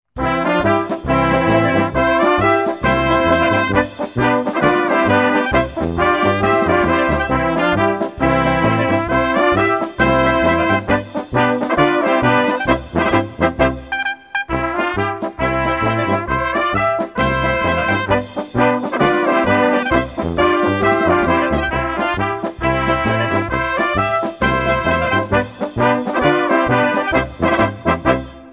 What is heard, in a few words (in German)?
Polka